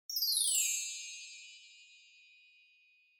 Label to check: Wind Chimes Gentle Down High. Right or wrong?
Right